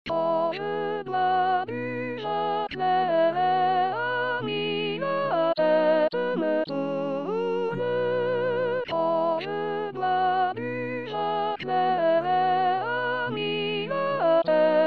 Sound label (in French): soprano voix; l harmonieux vigneron